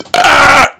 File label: belch